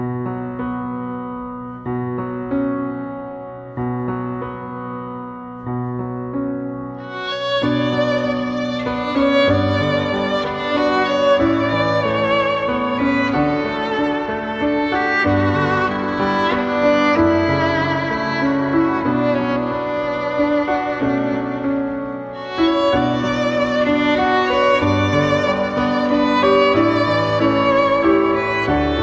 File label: Sad Melody